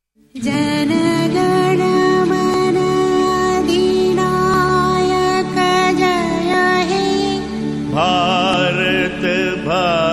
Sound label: national anthem indian music; punjabi